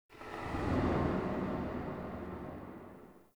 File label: Hub Texture K, Ambience, Dungeons, Under City; Silent Rose